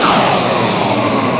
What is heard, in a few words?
SHOT